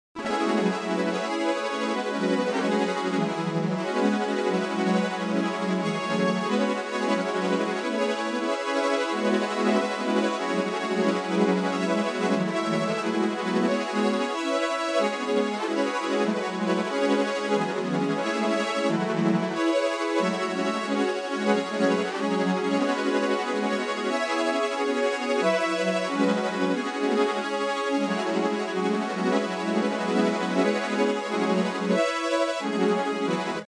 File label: granular string; Pads